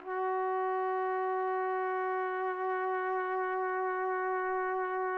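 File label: trumpet; Roland - LA Composer vol. 3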